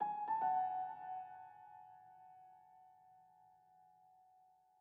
Env Field Day Ground

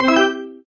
Win casino

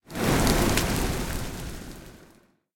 Fire; Puddle Plus

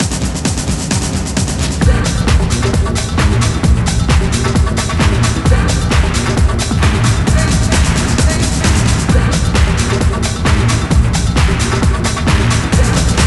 victory; nba live 2004; playstation 2

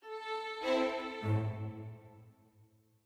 mysterious med end; Quivr; PS4 Windows gamerip 2016

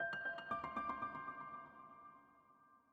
Deep Hole Dive Outro

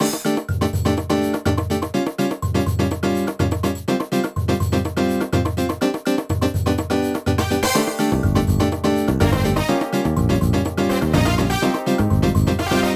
BGM Title; Saka Tsuku DS Touch and Direct

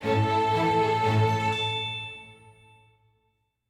happy forte end

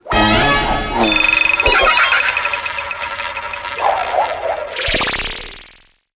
level up

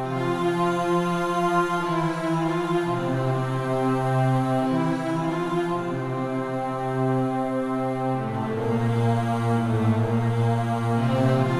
Loop Nofade Nobase; King Lucas

